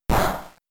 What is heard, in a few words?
Hit Weak Not Very Effective; Pokemon; Gen 3; attack moves; RSE